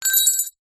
industrial wrench bicycle set down concrete ground